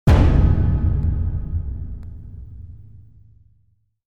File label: Trailer Hit